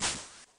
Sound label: Ascender; Pushover